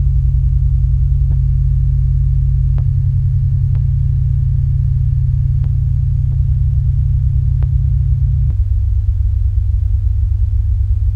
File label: pss-140 infinite notes